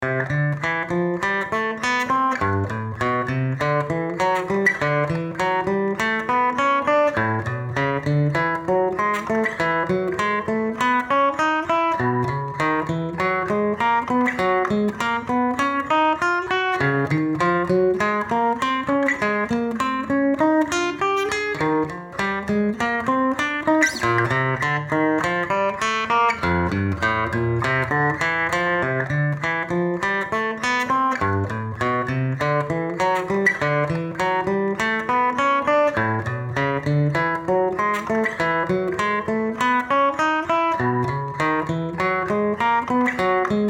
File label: Major scales moving in 5ths